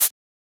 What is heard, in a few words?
Shaker; FLPRO